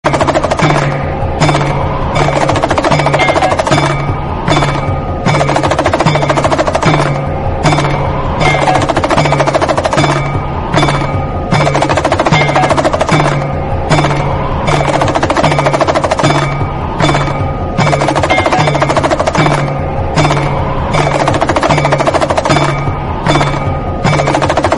damarukam sound; Lord shiva

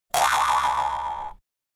Spring Jump Ringtone

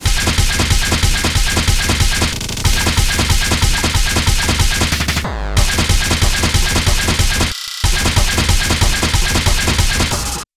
Techno Madness Remix sound

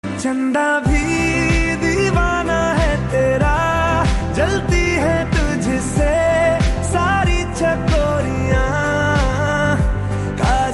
Hindi Song; Movie Brahmastra

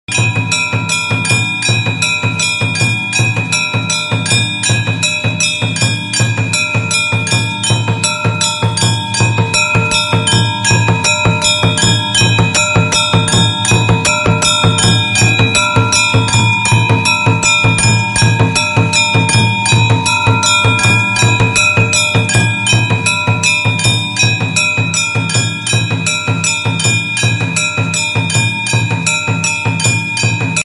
Pooja aarti bell sound

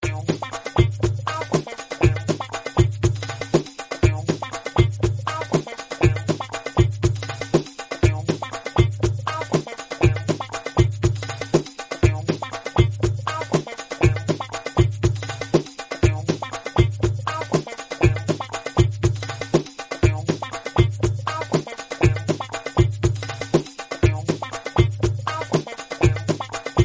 Funky drum beat

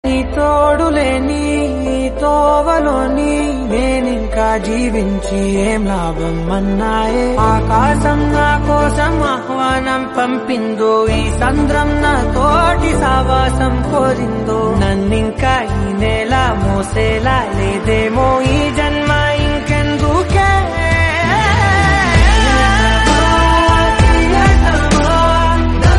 Telugu Movie

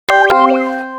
Wood blocks game sound

